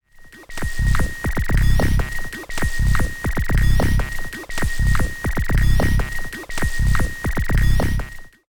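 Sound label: ALM Squid Sample Beat Layer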